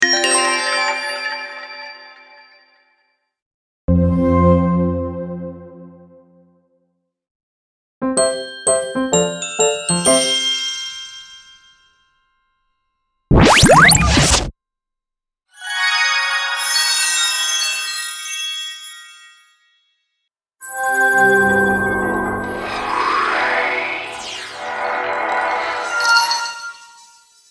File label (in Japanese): サウンドロゴ, 効果音